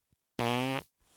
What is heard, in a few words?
fart; vegan